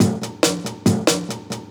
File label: Vintage beats